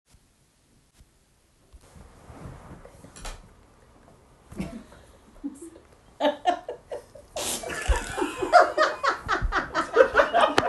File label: Laughing audio